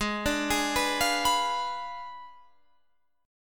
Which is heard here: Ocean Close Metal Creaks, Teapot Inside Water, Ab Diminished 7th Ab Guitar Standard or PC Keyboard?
Ab Diminished 7th Ab Guitar Standard